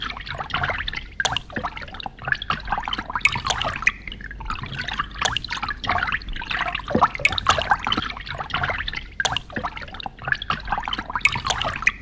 Drop, alarms; LG Qua tab PZ LGT32

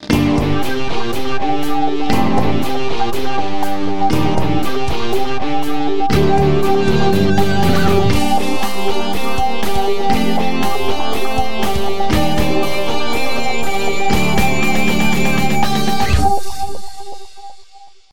Online Rock, ringtones; MyPhone; A919-Duo; MyPhoneOnlineRock

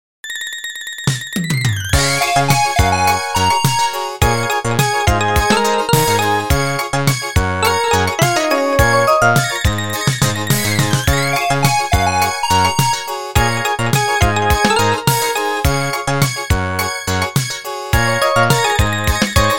Incoming Call; BenQ; B502

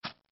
Keypress Standard; BenQ B502

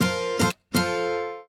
Strum, notifications; Honor Magic 7 RSR Porsche Design

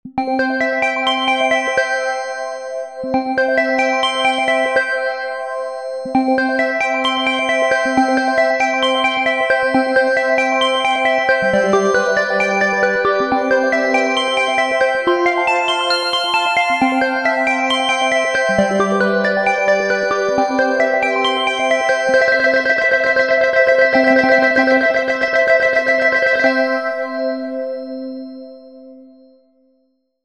factory test; BQ 5731L-Magic-S; BQ Russia

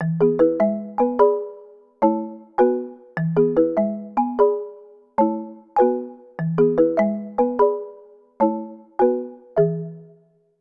Bluebell; INOI 2 Lite 2019; ringtones

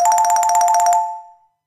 electronic; Smartisan OS 6.0.0; ringtones

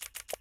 Camera Shutter

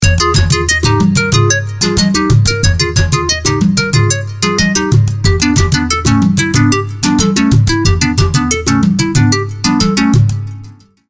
African Dance; Tecno Spark 7; ringtones

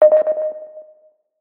Low Battery; Gigaset GS290

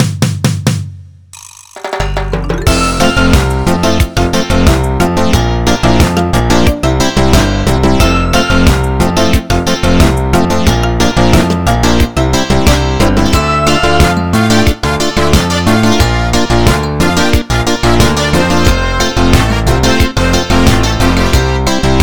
Caribian Melody; Philips Xenium E518; ringtones

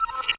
notifications; RED; Hydrogen-One

